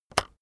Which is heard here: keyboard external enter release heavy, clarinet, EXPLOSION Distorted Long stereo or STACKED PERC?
keyboard external enter release heavy